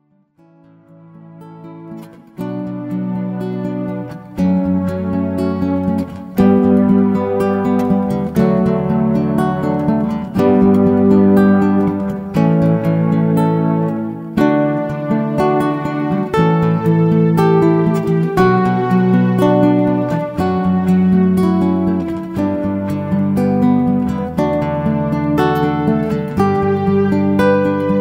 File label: Cannon in D, guitar